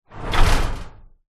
household garage door metal attempt to lift but locked